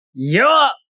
Yell Samurai Yo, Male yell, samurai warrior war cry; Yelling Sound Effects; (human voice)